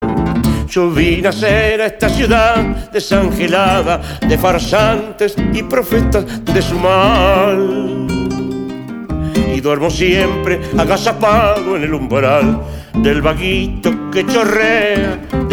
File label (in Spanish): tango diablo